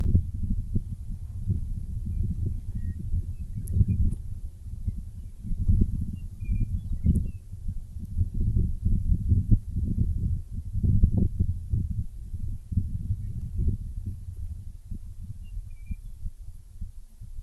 Wind, the wind in the sage thacker pass soundscape